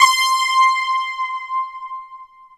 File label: JV polysplit, JV-1080; Syntec; Wall of Sounds